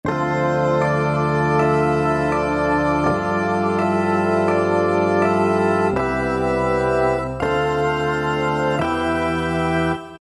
Choral Organ; Roland PN-D50-03

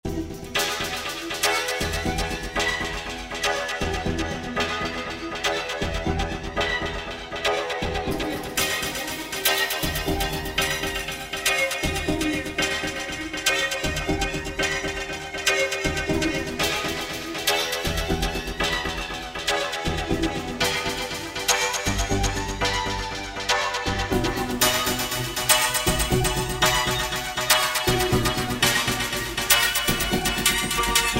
INSPIRATOR, Roland V-Synth

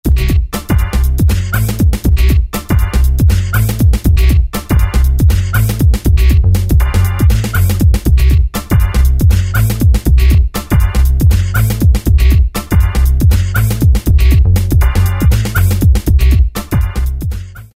Hip Hop Pattern, Roland MC-303

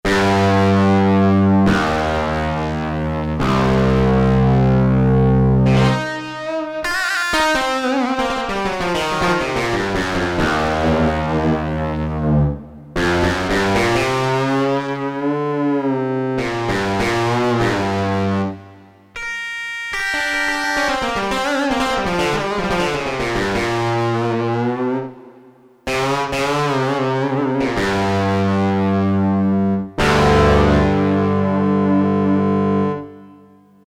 OD Guitar; Korg DSS-1; Guitars

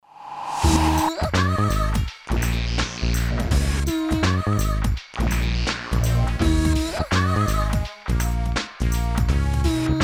Dance, Roland M-DC1; Factory demo songs

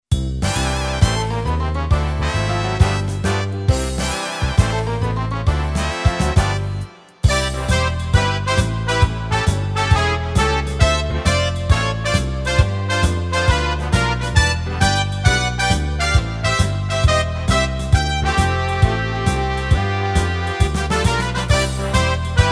Big Band 1, Yamaha PSR-550 style

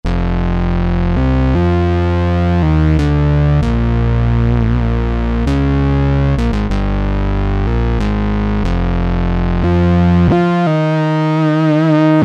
BA VC1, Yamaha TG77; Factory Presets

